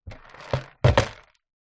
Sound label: foley plastic pot containing small debris set down on table